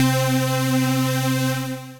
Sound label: Light Ray Pad, Synth Pad; Ableton; Factory Packs; Build and Drop